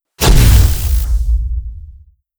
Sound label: dragon wing attack; Stellaris; guardians